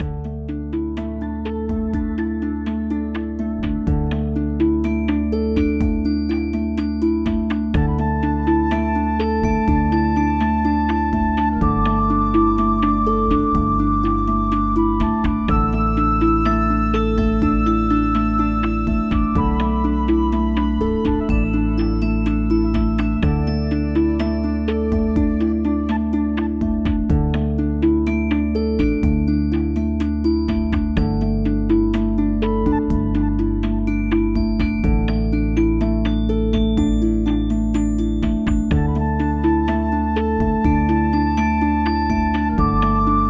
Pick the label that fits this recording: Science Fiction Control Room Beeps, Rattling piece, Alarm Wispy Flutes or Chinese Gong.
Alarm Wispy Flutes